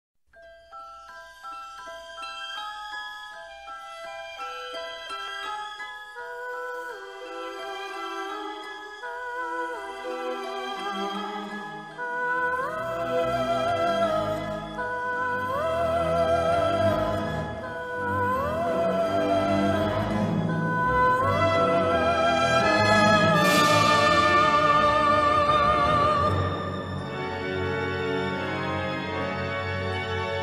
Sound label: Instrumental; Phantom Manor